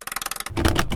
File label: clamp, ships; Cosmic Rage; ships misc